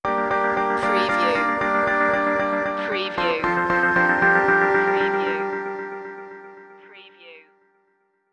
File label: purple dream stinger